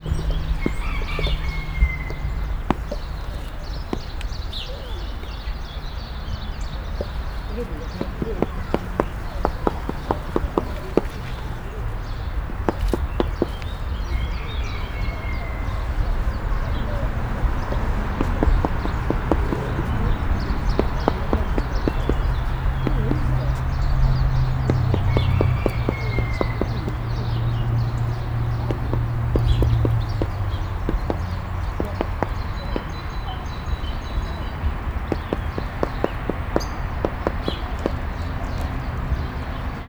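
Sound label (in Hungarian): strucc; állathangok; nyíregyházi zoo 2010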